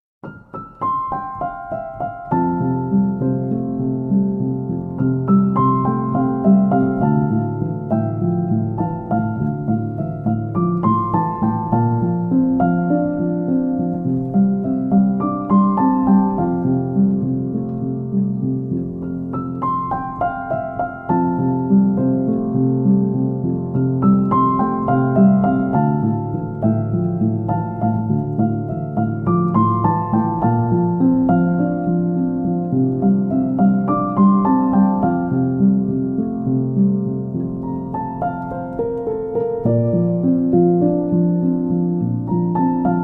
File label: song calm nature; dolphin tunes